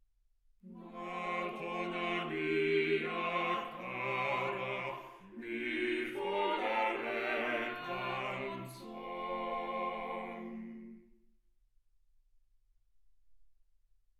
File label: quartet